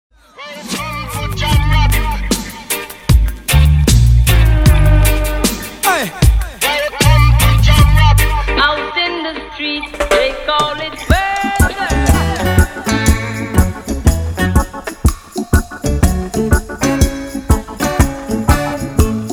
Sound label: Reggae Mix; Mobile DJ